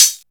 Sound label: studio hi hat f sharp key; hat samples